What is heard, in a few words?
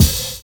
HANDCLAP; Univers Sons